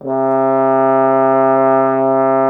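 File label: bass trombone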